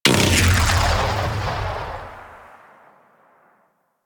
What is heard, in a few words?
Explosion A; Warframe; Weapons; Corpus; Vandal